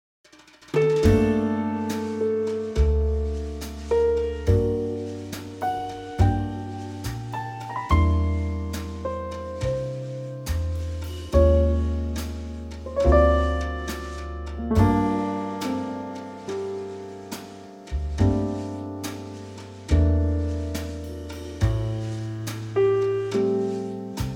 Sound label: trio; Gm Trio; key - Gm - vocal range - Bb to Eb